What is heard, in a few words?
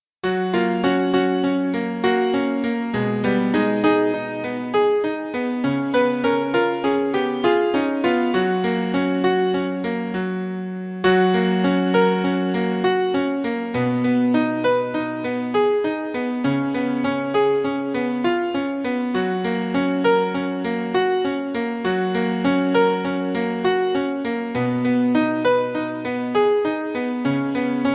tenor, beautiful dreamer accompaniment